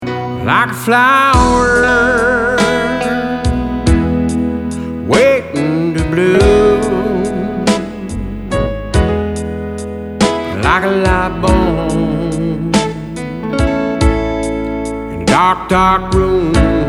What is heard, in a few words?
ballad n blues